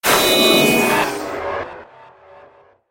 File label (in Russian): skrimery